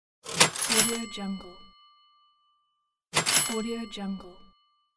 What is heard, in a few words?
cash register; wavbrulee